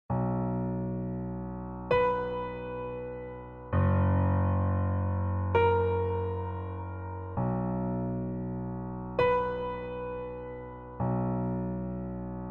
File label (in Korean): Piano 멜로디MR